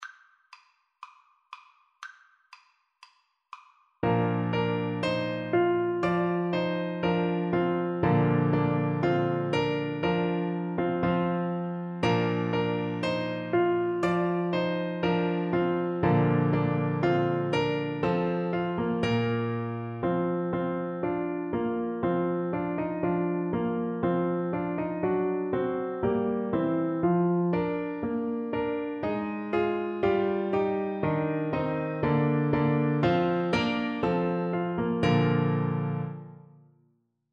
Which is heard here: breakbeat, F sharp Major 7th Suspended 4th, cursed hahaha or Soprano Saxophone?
Soprano Saxophone